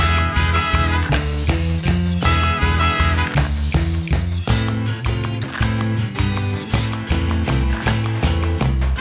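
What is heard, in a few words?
music food inferno suspense no vocals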